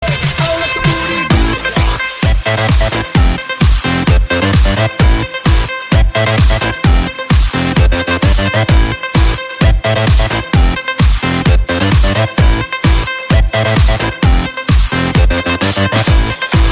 Electronica

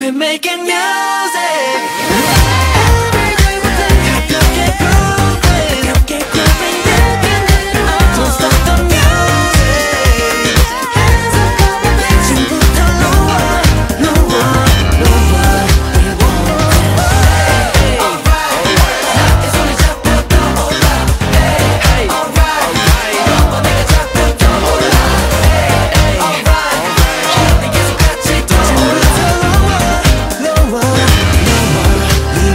Boom Boom Bass

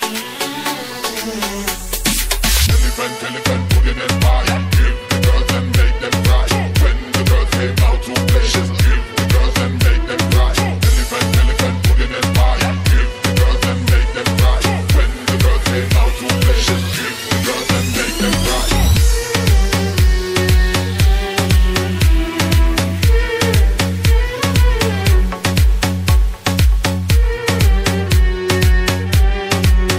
Remix

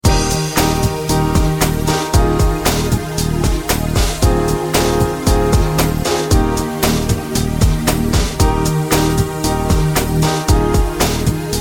Playful Electro Music

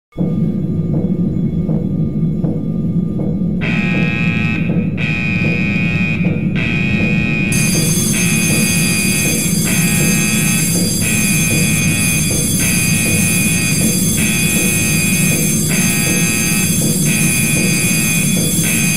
Alarm Sound Navy

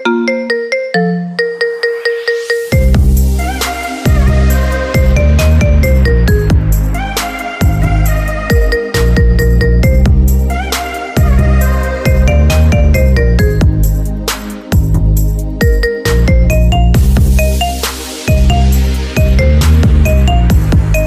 Apple iPhone X

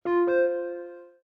Samsung Galaxy S21 Notificaciones

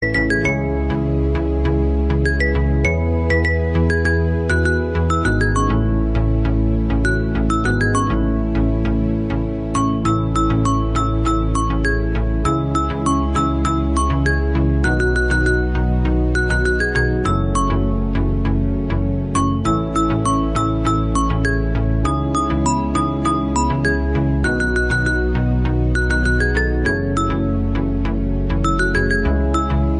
Lite Music Xylophone

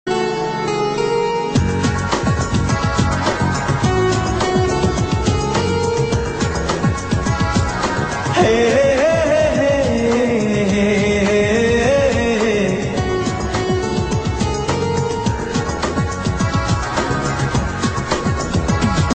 Acoustic

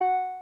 iPhone New Mail

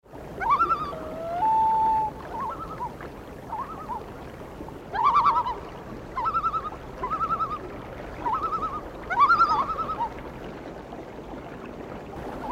Real Loons

